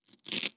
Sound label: Tearing Rustle